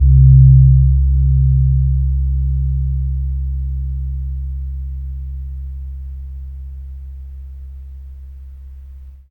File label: SEWUKAN Db3, GAMELAN SET; Spectrasonics; Heart of Asia